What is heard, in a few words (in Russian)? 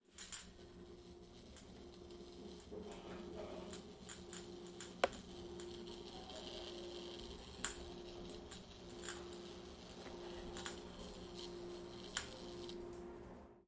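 звук неисправной лампы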